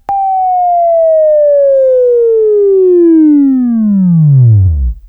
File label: WAV Left, Sweeping; Sweeping Training program